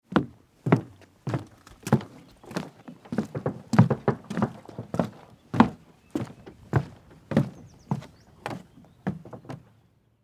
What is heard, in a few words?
Footsteps Boat Dock Walk Short Distant Birds; Human